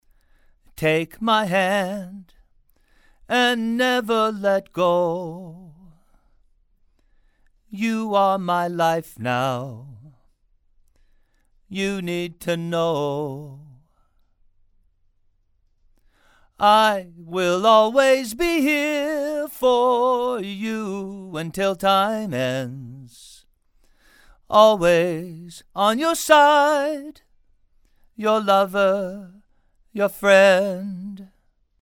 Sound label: Male Vocals